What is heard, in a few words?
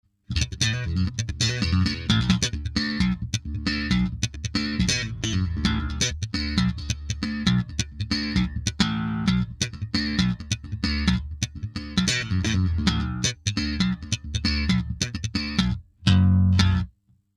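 Chorus slap, guitar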